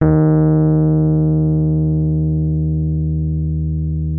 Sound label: D#2, RHODES; Session Instruments; Best Service ProSamples vol.42